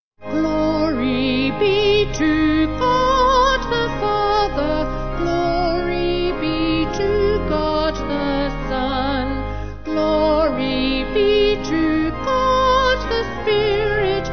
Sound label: vocal organ; Glory Be To God; Regent Sq